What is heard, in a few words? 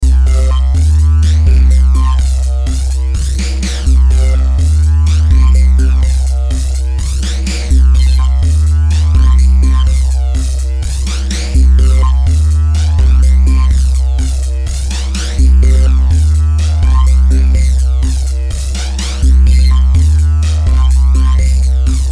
Beat Loop Synth Industrial Distortion Big Bass Flanger